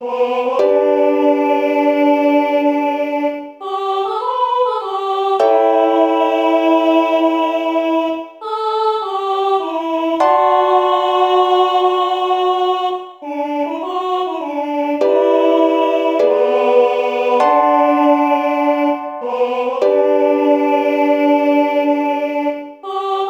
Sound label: UKE, ukulele